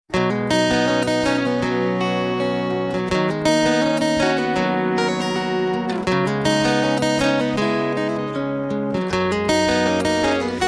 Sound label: Guitar